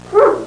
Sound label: Single dog bark